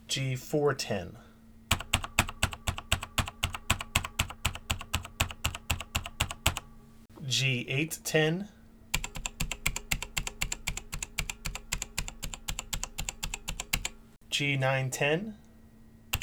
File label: key sounds